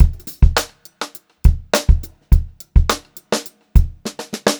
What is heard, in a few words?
Funk Groove Drumloops; USB Soundscan vol.38; AKAI